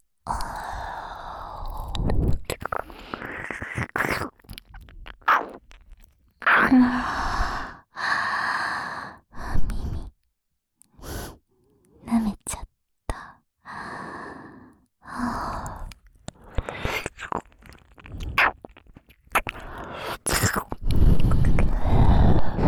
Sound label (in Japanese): ねっとり耳舐め; 放課後限定営業せっくすクラブ〜生意気おまんこギャルのドスケベイクイクおちんぽマッサージ〜